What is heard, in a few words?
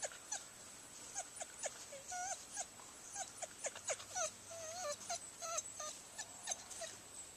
Coyote whine